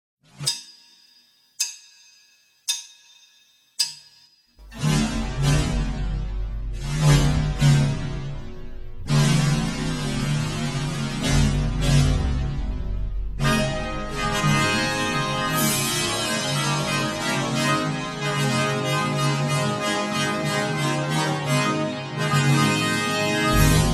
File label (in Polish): fanfara